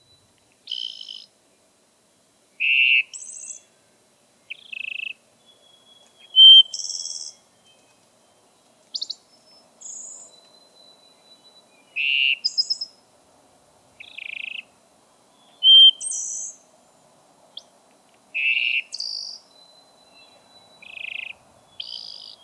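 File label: sounds of thrush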